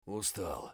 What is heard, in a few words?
bear low hp; eft